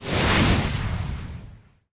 punch slow

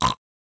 snore

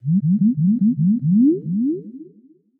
smoke

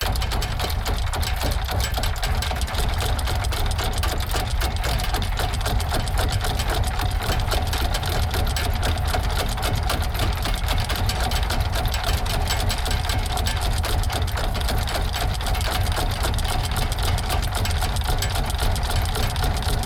clock tower internal

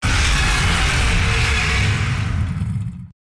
dragon cry

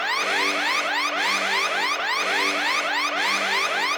emergency alert

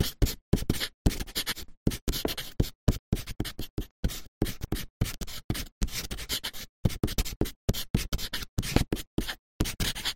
magic cap write

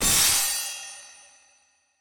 decision, button

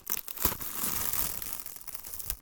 saran wrap wrap

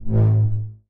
menu, button

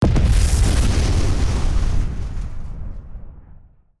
bomb, battle

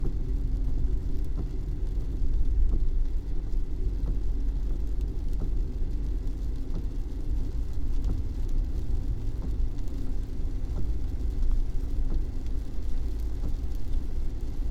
car interior rain

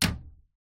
closet close

